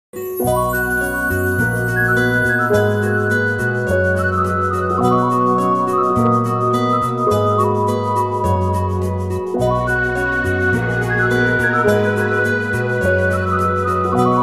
Drama Ost Ringtone; Inaam E Mohabbat

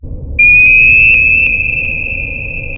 Active Sonar Ping Ringtone

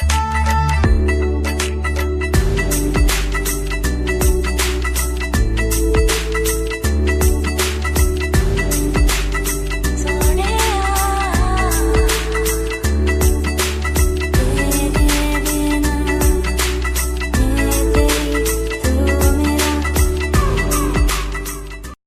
Punjabi Ringtone Bgm

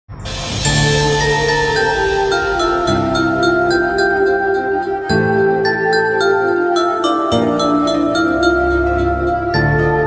Instrumental Ringtone

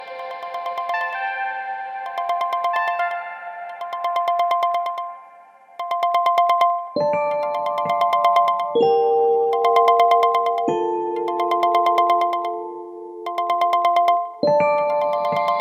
iPhone 12 Pro Bright Eyed Original Ringtone

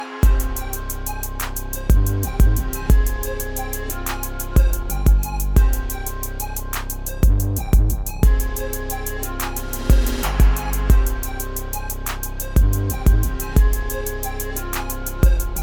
Vivo Nex official ringtone

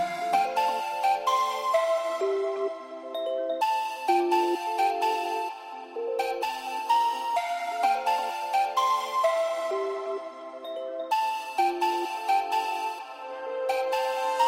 Huawei Ringtone; Huawei Y7 Prime

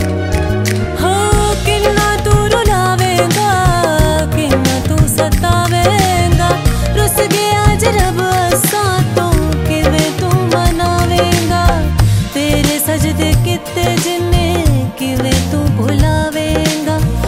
Sad Punjabi Ringtone

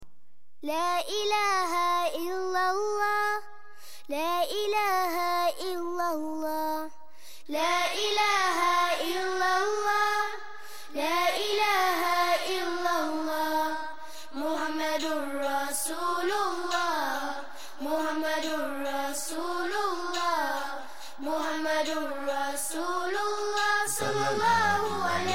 Baby Voice islamic Ringtone; Al-Khaaliq

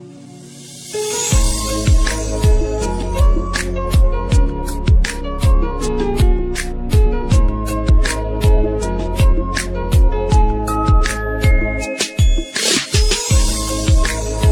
Instrumental Music Ringtone

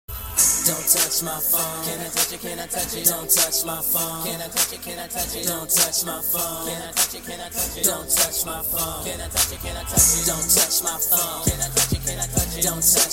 English Songs Ringtones

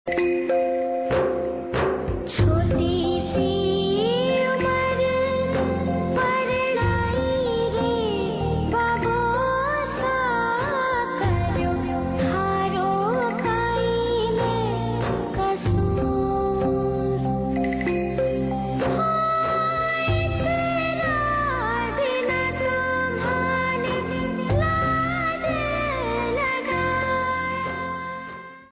Old Hindi Gana Tone

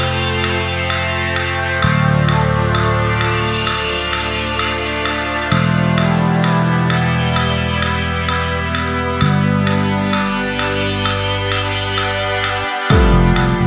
Tune Orchestral; Huawei

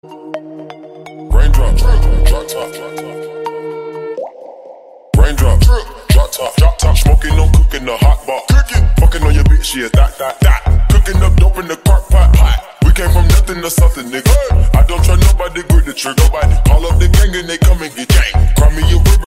Samsung Galaxy Round Official Ringtone

